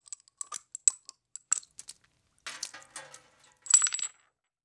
Glass Vile Movement Pour; Fairlight Sound Lib

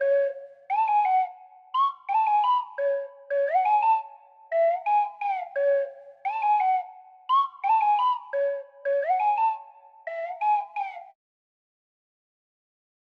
173 BPM F# Min Flute; Melody; Cymatics; 2022 Melody Collection (BETA); Pied Piper

